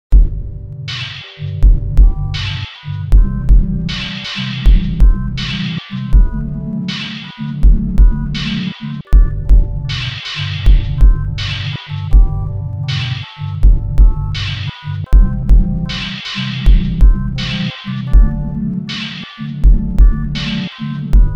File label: Sinwave Project